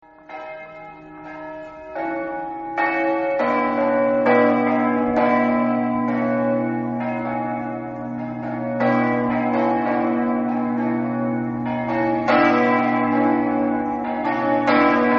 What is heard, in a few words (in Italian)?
pagnacco, campane